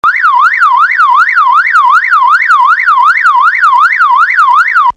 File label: car-alarm